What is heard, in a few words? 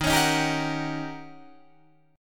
Eb 7th Sharp 9th, Eb, Guitar, Open D